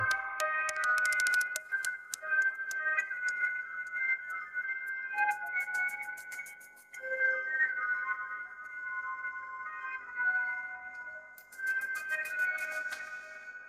ringtone hiphop

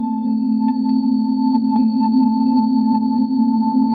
notification

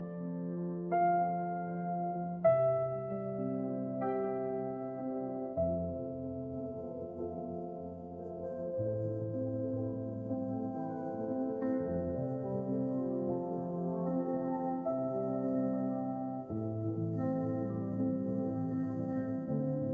ringtone emotional

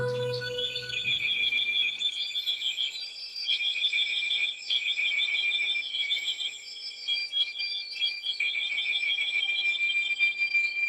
ambient nature

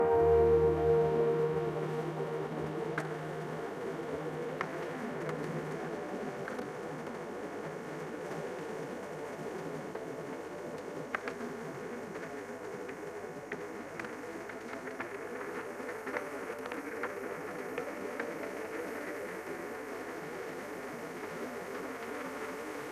ambient nature

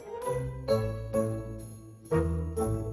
funny

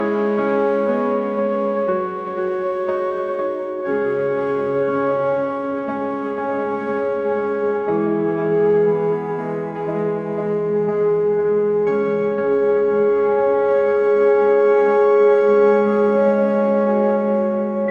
ringtone anime

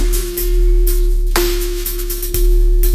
notification

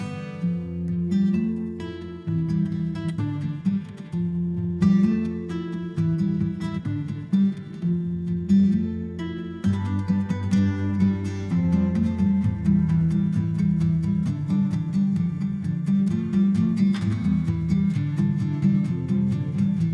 ringtone romantic

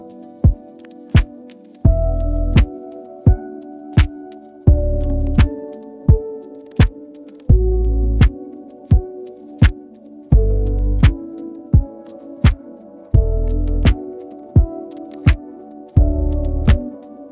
ringtone lofi

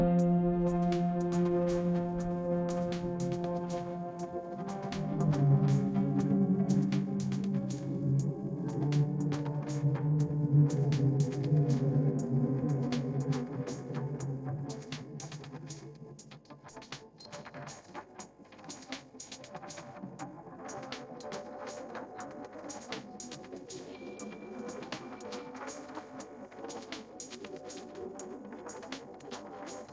ambient urban

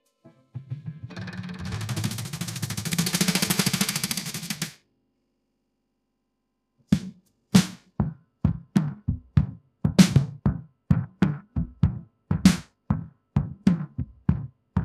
ringtone hiphop

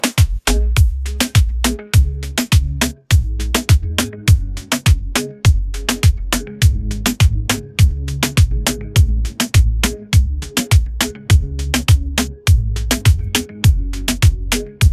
ringtone reggaeton

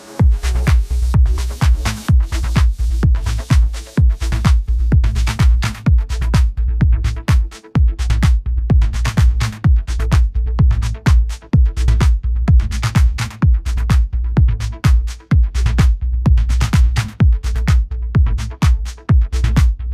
ringtone edm

